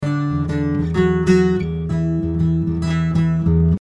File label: happiness gitref